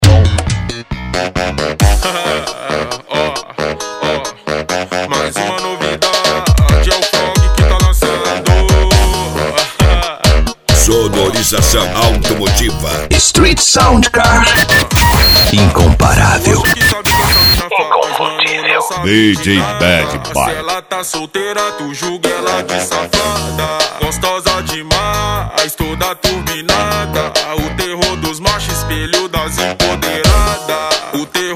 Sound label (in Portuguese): sound car funk; street